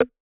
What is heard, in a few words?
perc bop; Hip-Hop; Drum One Shots; SD CAICOS Hip-Hop Sample Pack; Slate